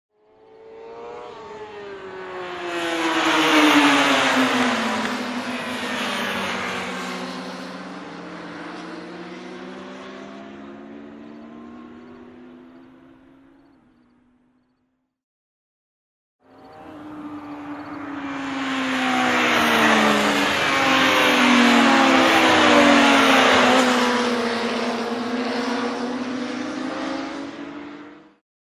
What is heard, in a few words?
Ring race motorcycles are passing by; motorcycle racing